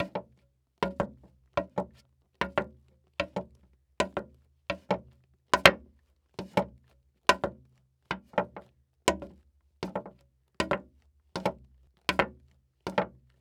Foley Footstep Hard Sole Dress Shoe Walking On Wood Boardwalk; Foley Footsteps